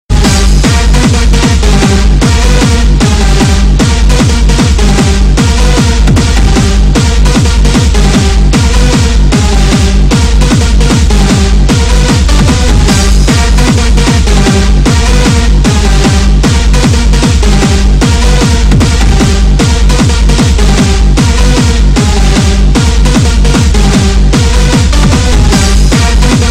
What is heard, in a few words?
Techno Rave